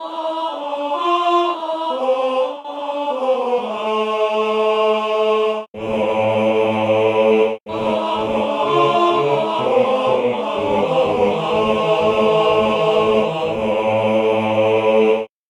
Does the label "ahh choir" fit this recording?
Yes